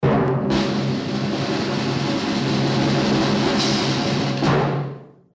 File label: Drum loops, Rolls